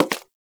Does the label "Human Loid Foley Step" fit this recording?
Yes